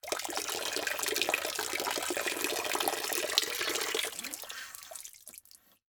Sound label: Liquid Water Water Drips From Squeeze Toy